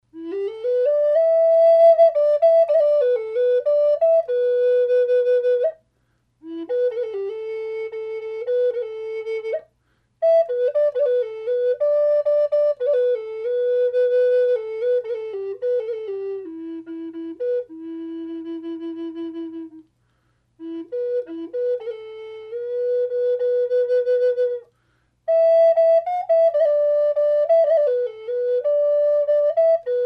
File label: Polar Bear Flute